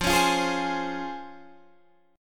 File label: C sharp Major 7th, F, guitar chord